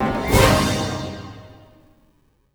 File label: STAB, Hip Hop R n B; USB Soundscan vol.19